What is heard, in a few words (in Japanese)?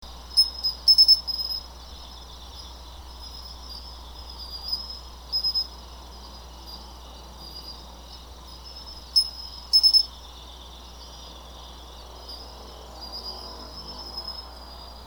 matumushi